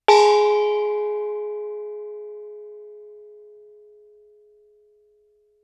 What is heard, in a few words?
thai gong ff Ab4; MIS Pitches - 2014; Percussion